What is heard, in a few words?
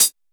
BEAT HAT